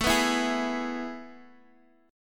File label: A Major 7th Suspended 2nd, Guitar, DADGAD; chord